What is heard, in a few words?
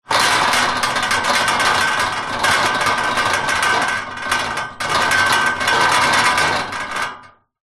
impacts metal frame bed loose wobble rattle